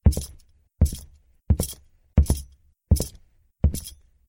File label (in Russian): topot nog